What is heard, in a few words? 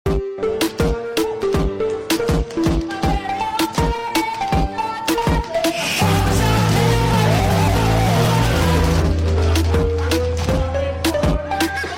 phonk